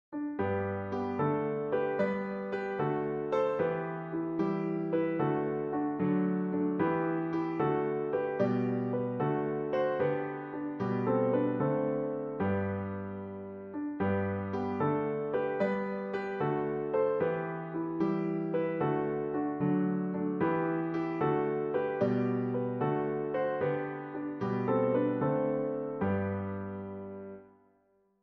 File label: i saw three ships tune a keyboard; christmas music and carols